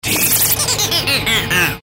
Galery Noise; Sound Ideas - Galery Noise 001

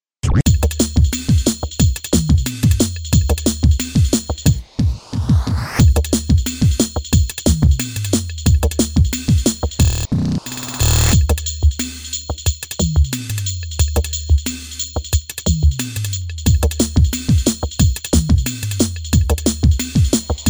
bed change up

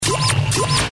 Galery Noise; Sound Ideas - Galery Noise 001

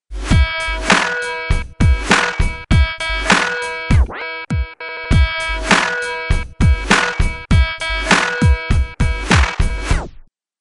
mini bed thai

